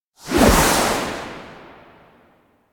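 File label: electronic corridor door appear; anemoi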